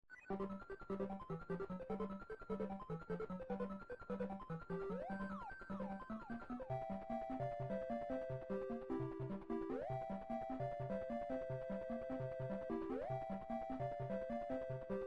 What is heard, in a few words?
Sonic game gg; Zomboid; Video Game Consoles